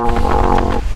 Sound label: die; mahlendur